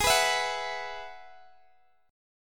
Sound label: Ab Minor 7th Sharp 5th Ab, Guitar Open D; x,x,18,17,19,16; down